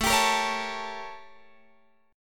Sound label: A 7th Flat 9th Guitar Open F; A7b9; strummed